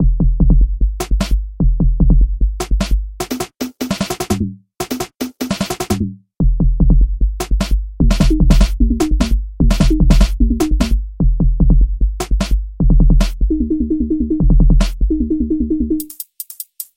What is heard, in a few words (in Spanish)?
Ritmo Hydrogen